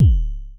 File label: FM Kicks C#1, Vermona Kick Lancet; LookIMadeAThing Kicks